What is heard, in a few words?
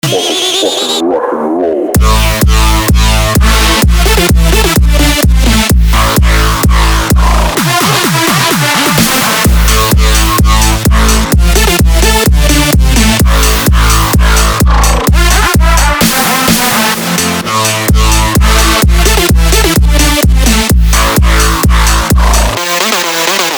strong edm